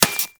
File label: Laser Impact Metal; Warframe